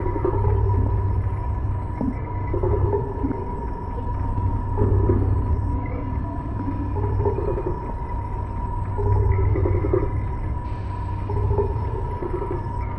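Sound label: lithoid ambient transport ship hum; Stellaris; lithoids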